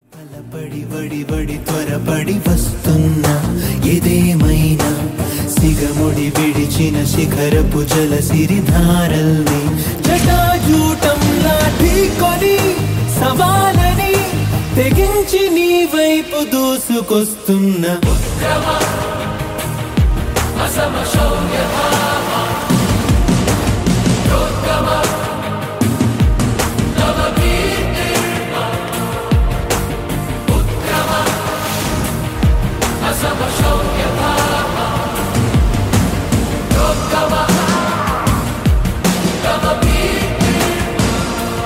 Telugu Song

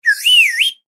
cartoon whistle

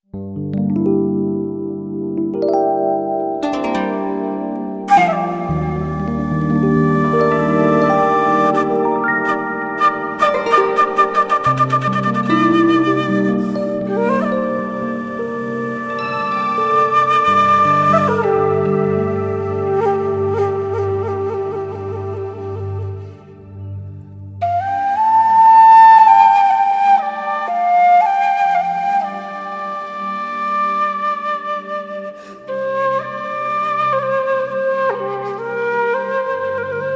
bamboo flute

